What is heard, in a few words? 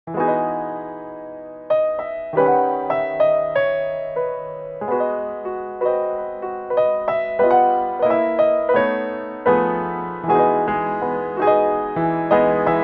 solo piano